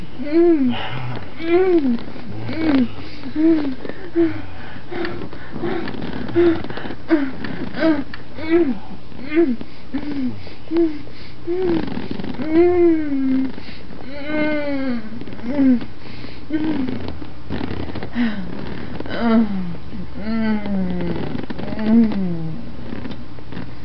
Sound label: leather squeak sex